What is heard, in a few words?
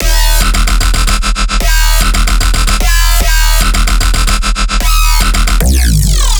Bass Loop D# Tristep, Dubstep, 150bpm; Ghosthack; Dubstep Sound Arsenal